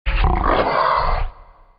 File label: Growling Animal; Animals